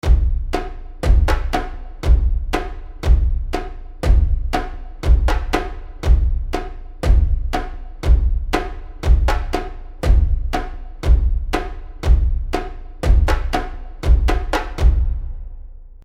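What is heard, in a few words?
Primitive Drums Seamless Loop; battle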